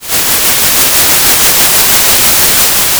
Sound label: Sound of a thunderstorm